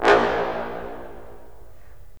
BRS, Roland LA Composer; Roland - LA Composer vol. 3

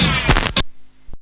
stop fx; Ragga & Dub Reggae; AKAI; USB Soundscan vol.27

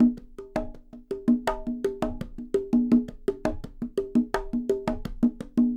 Percussionworks; Sampleheads; NYC Percussionworks; 90 s Sample CDs

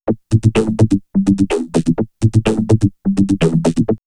Vocoder Loop; X-Static Goldmine; VocoderLoops

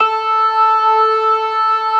ORGAN SYNTH; InVision Interactive; Keith Emerson

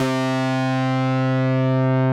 Synth C, Synth Prophet One Shots; Electronisounds

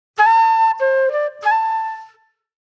Reflective Flute

